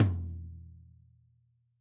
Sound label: TOM HIGH HIGH TOM; tom 1; Sonimus; n series drum pack